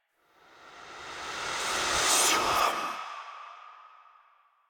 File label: Cave Whispers; Orokin; Warframe; Ambience; Stalker